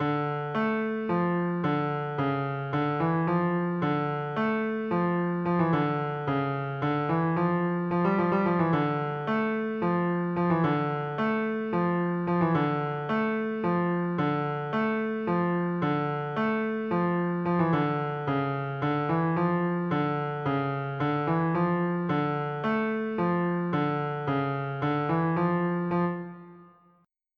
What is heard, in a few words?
fugue